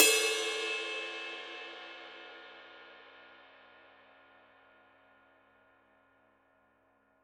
PAISTE TWENTY MASTER MEDIUM RIDE

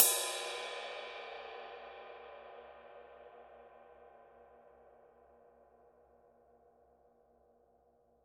PAISTE TWENTY MASTER DARK DRY RIDE

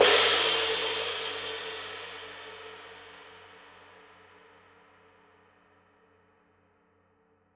PAISTE TWENTY MASTER DARK CRASH RIDE

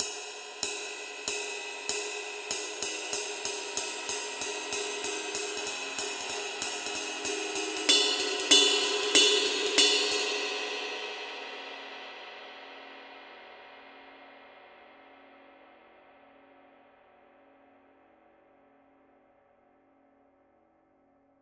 PAISTE TWENTY MASTER DEEP SIGNATURE GROOVE RIDE

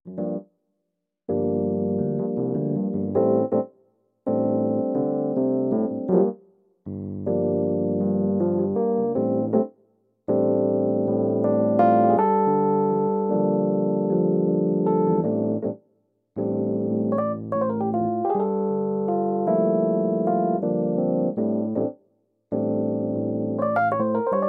CASIO PX-5S

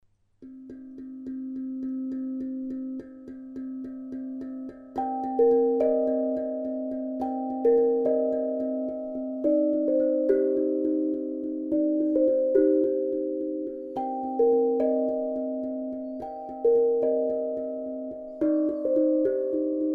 ZENKO ZEN07 PLAINSTEEL

